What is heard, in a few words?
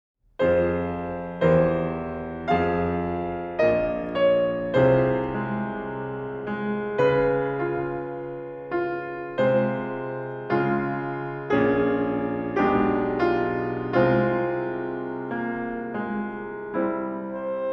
Adagio Tarantella